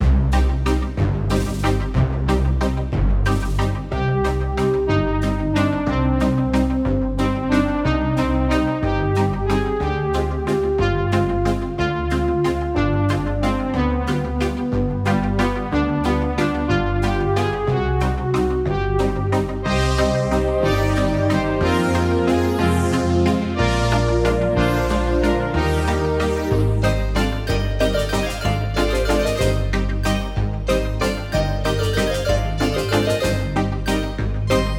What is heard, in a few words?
waltz, electronic music; Mr. Robot, Vol. 2